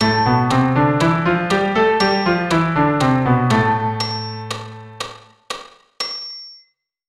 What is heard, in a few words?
Am Natural Scale